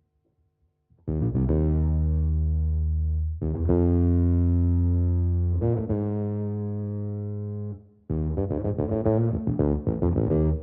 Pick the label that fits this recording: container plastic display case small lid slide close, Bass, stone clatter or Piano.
Bass